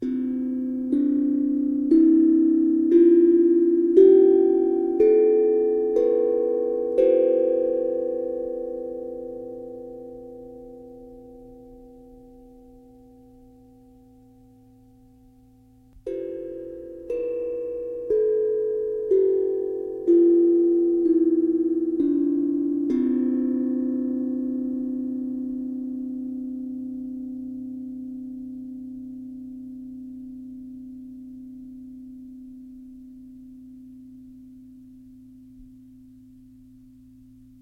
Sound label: chakra drum; mini